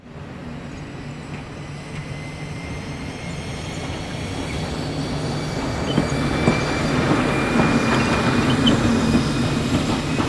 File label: train rolling; best service Blue Box